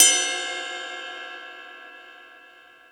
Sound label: Jazz Drums, E-MU Pro-Cussion